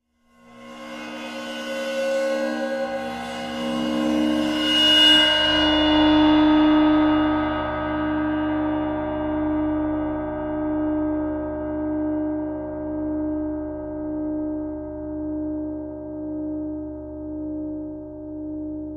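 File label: Ride 22 Cymbol Bow close up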